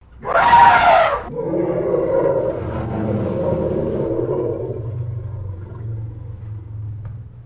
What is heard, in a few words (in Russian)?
slon afrikanskij trubit i richit